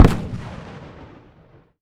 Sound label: IED Explosion Far